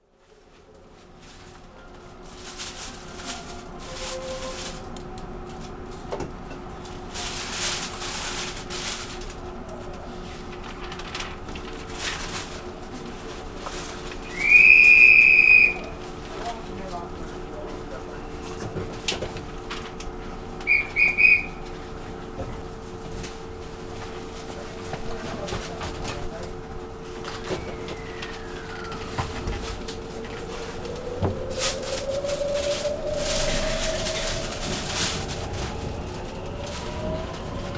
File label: thunder